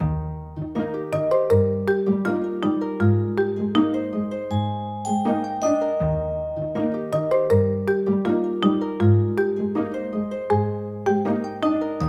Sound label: Children Learning, background music